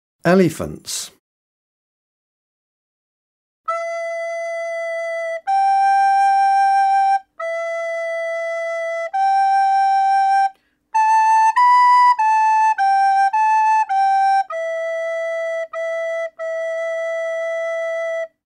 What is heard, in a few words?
Recorder